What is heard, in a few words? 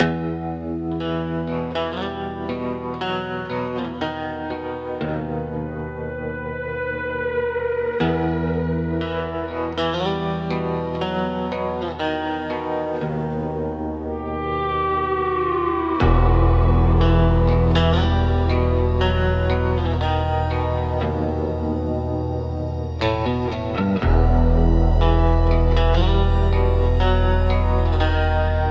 midnight in dead creek a eerie country ambience soundtrack; dark-country